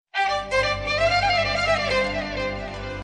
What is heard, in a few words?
Violin